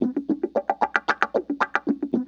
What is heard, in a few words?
WAH PATTE, Funk Gtr & Wah; PlatinumPhattCD2; E-MU Producer Series Vol. 8 – Platinum Phatt